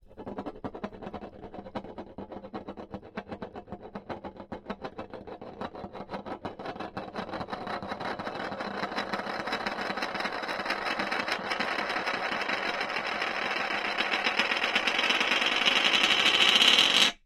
spinning crescendo glass surface turned wooden figure glazed bright slightly rough; eulerian